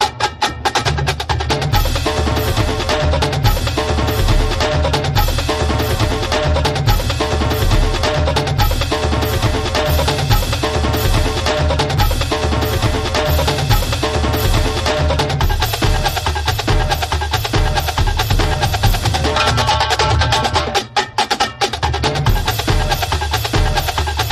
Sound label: Plum Drums